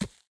Step; Lethal Company

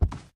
Carpet

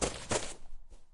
Sudden Step

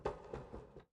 Wood Creak

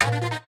ghost device fully charged

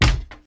Extension Ladder Hit Wall; Lethal Company

